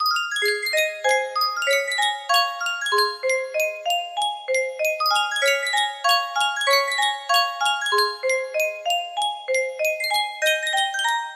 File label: yunsheng music box, melody